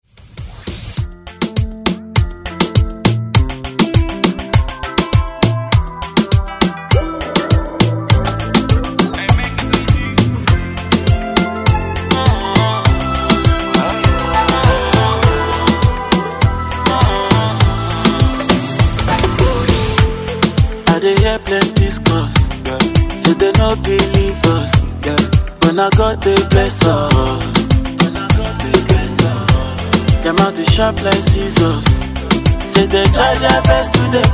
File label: Tekno Afro Moombahton Redrum